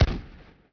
Gun Fire; shadez

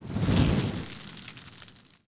fireball; anito

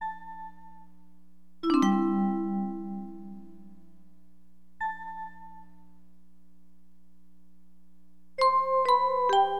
VIBES, Keyboards; LiveAct Pool 1; AcidJazz Funk

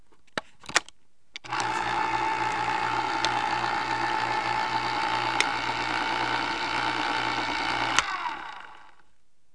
Electric Can Opener; KABOOM! - Wild and Wacky Sound Software; Household; Kitchen

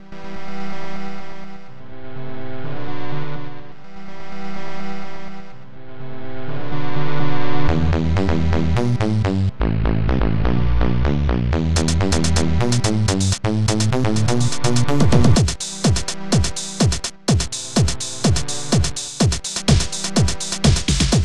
Cool Techno

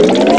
Sound up; Funhouse of Love

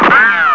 funny shot, weapons

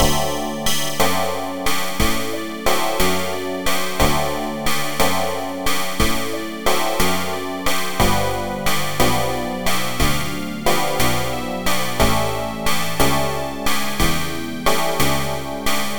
blues

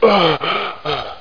gasp; Hexen2; player

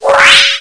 Riff; Apeiron

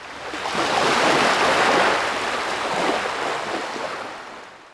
wave lapping; DAoC

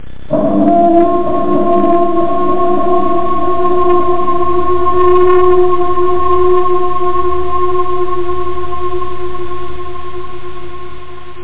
wolf cry

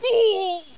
SCREAM

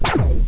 SHOT CANNON; gubble

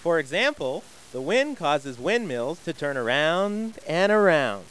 move

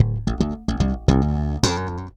bass loop, Rock

